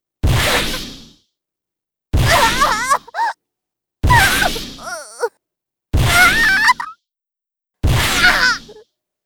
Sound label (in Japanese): ダメージ声(大); 効果音あり